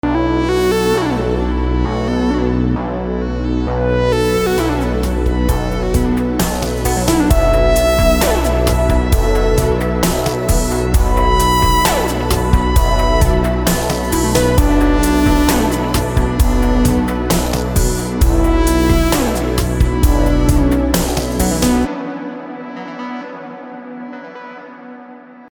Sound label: Riser; Vengeance Sound Avenger 2; Generative 1; Tomb